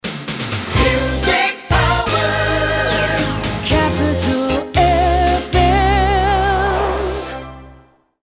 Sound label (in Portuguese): Vinheta Music Power Lenta Rapida; Rádio Capital 92,5 FM - Vitória 1987